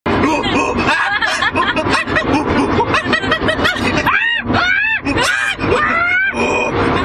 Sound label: monkey